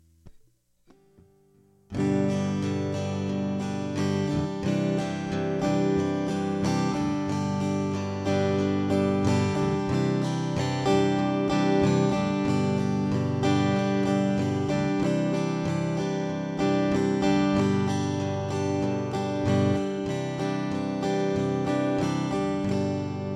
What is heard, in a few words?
strumming eighth beats G Cadd9, guitar